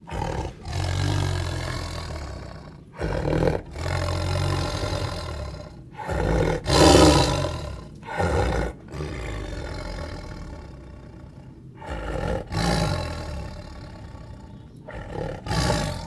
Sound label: White Tiger Angrily Growls, Snores and Roars; Tigers; Sound Ideas